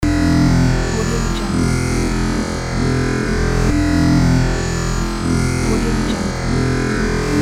cyber scanner loop

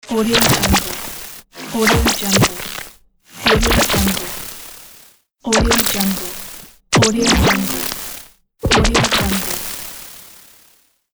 glitch pack

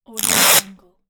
sticky tape rip

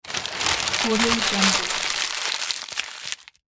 crumple paper

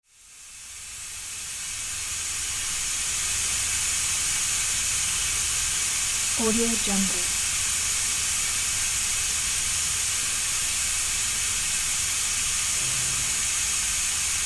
steam

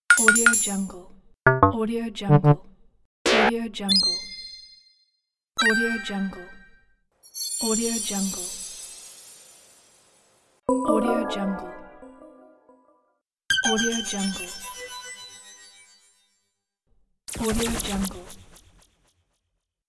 game interface sounds

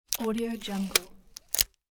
tape dispenser

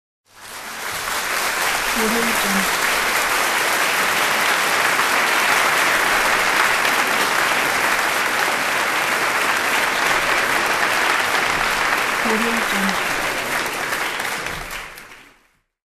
applause

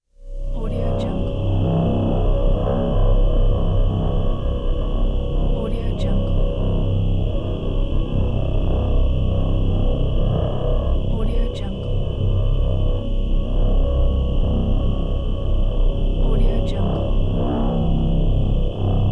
scifi ambience

